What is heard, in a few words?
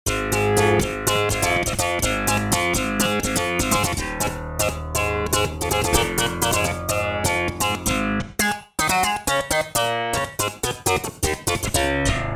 Syn Guitar; Factory Patches